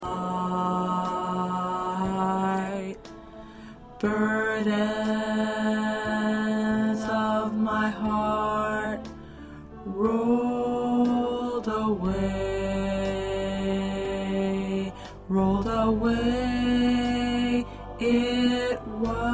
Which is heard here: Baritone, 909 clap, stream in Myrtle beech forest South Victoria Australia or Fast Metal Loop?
Baritone